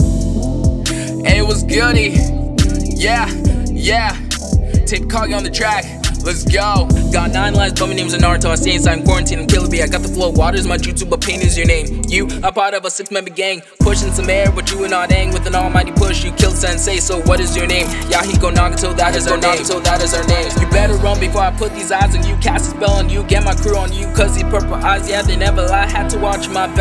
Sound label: Anime Rap